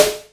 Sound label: Roland MC09 Snare